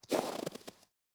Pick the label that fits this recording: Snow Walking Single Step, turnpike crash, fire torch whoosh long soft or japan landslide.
Snow Walking Single Step